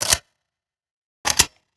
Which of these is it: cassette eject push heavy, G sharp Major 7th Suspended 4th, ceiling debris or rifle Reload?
rifle Reload